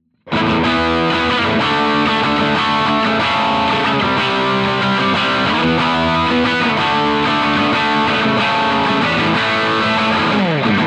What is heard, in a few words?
characteristic sound; jensentone